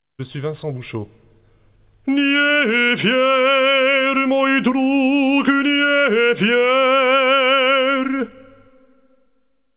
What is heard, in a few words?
vocal